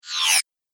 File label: Magic Power Down; Magic Sound Effects